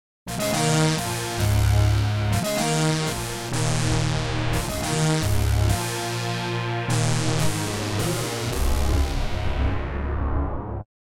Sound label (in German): Analog Modelle + Resynator Mod